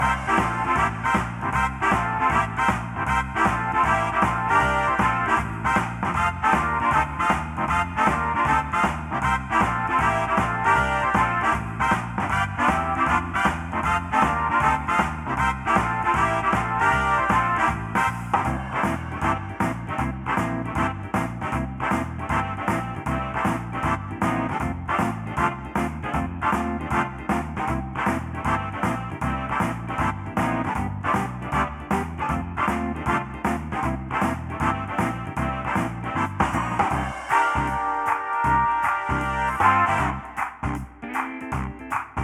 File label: Shufflin Lo Fi